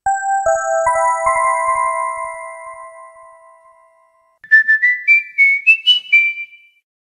iphone xs max sms

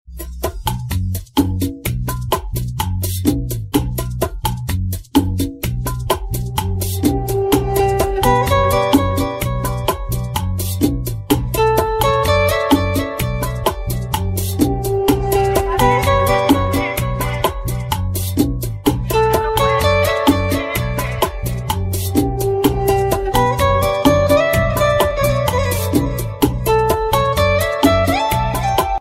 latino music guitar

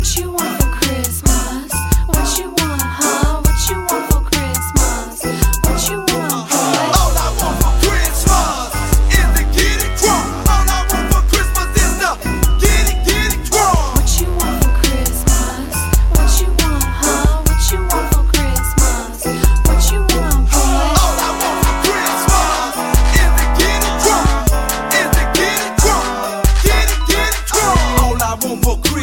Crunk Christmas

